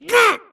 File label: grunt death neck; Titanfall 2